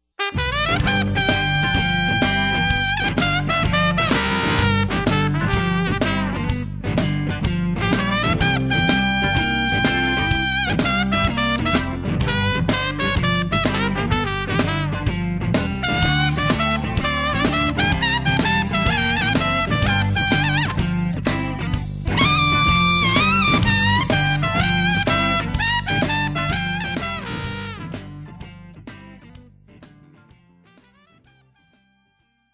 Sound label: westside shuffle